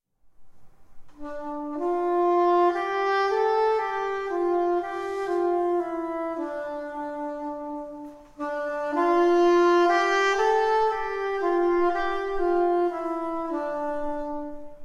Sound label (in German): Improvisation über "Alleluja" - Sopransaxofon